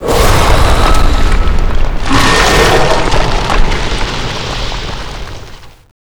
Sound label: spell skeletons horde rise